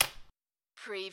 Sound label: drop pen on desk